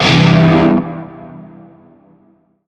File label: Shawzin Phrygian Chord; Warframe; Narmer Shawzin; Chords